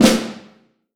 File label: Vintage Snare Drum, 6.5X14